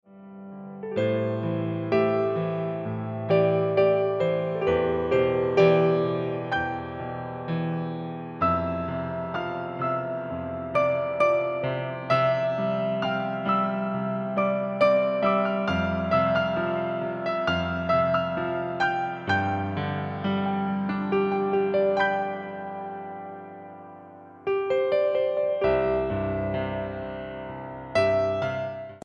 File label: Ringtone Piano Music